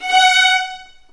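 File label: F#5 violins; The Ultimate String Collection; East West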